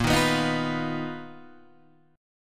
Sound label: B Major 7th Flat 5th Bb, chord shape